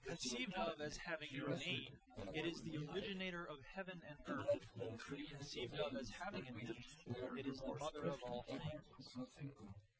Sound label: male inst sim; SiSEC08; SiSEC underdetermined